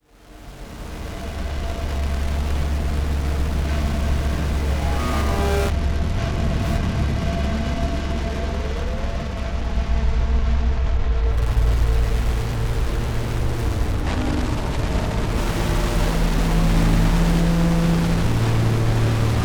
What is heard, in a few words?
Distortion Static; AdobeCC; Imaging Elements; Module Production Element Title Imaging